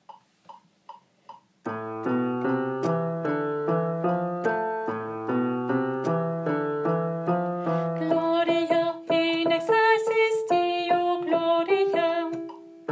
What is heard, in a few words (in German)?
Gloria, Alt I; Alt I (Teil 1); Messe; Chor